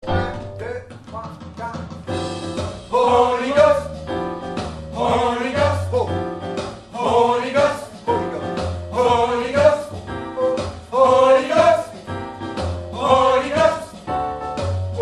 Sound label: tenor; holy ghost